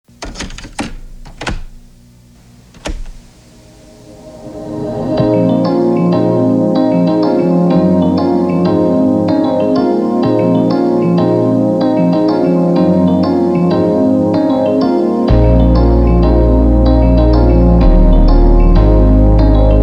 Synthwave

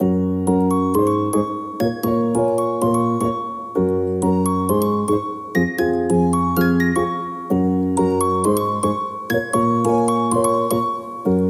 Swoooop

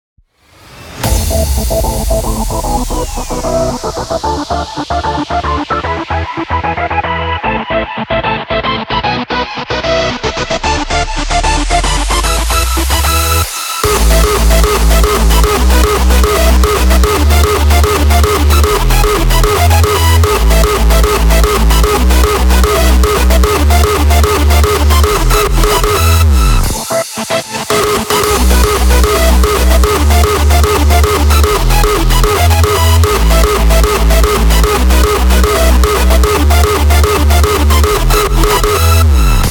Hardstyle Template

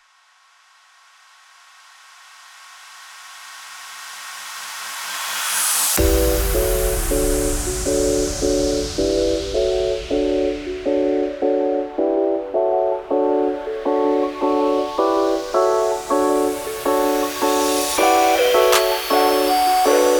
TRAP

